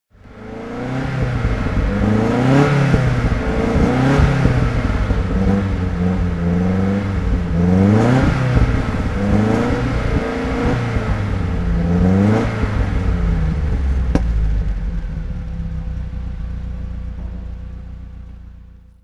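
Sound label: alfa romeo zagato 1600 throttle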